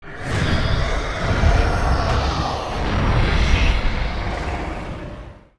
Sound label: chimera